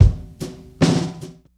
Phat time 76bpm, Hip-Hop Street Beats; Hip-Hop Street Beats Construction Kit; Street Beats by Poogie Bell

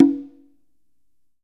CONGA OPEN; Big Fish Audio; Ross Garfield Drums VOL-2; GM PERCUSSN

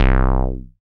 SYN TB SUB, Roland TB-303

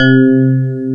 ELECTRIC PF, Piano; AKAI S6000 CD-ROM - Volume 1

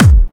Clubby Kicks, Bassdrums

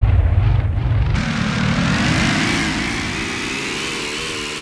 Kaos FX, Ambient Grooves

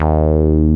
wow bass; Masterbits; Dance Trax

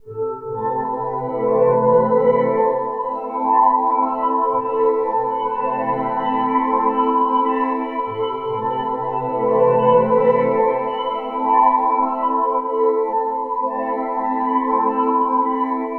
Endless Orchestra, Tonal Atmospheres; Sonic Foundry (Sony Creative Software); Myths of Technology

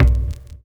300 HZ

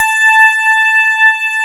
CLAV, FUNK FM CLAV

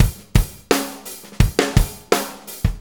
Fonky, Drum Tools, Loops

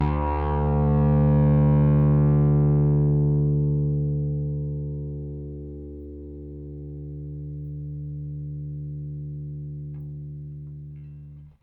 RickThumb-C, RickenbackerBass

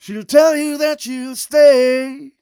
m 127 mel a, Vocals

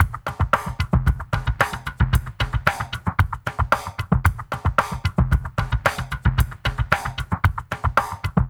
Liquid Grooves; Spectrasonics; 113 LIQUITEK

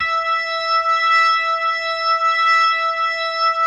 E5, B3

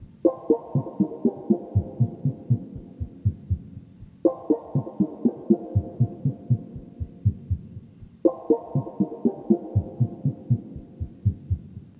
Arpeggio, keys; Sonic Foundry; James Johnson Spektral Minimalism; Sublime Keys

